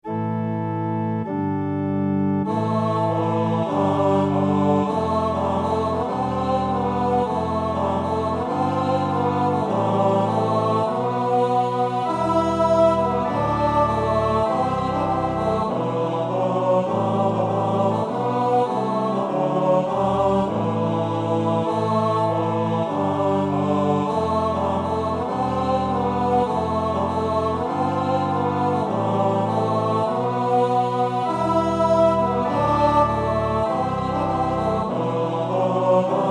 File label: Tenor